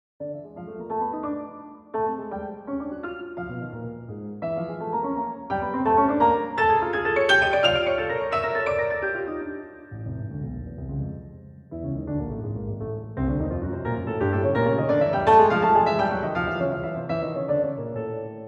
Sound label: Ukrainian Variation